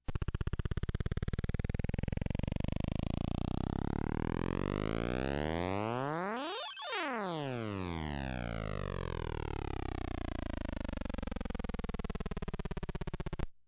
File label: variable frequency periodic noise